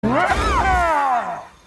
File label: Rage